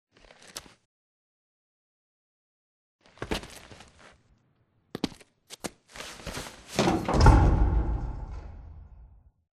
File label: grunt jumps in; Titanfall 2